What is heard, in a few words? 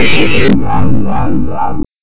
alert; svencoop; necroscasm